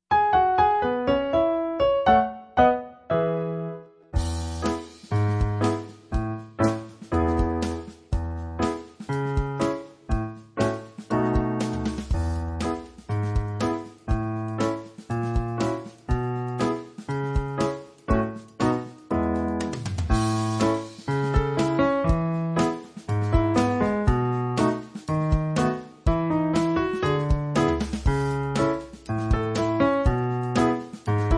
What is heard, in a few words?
Ragtime accomp, viola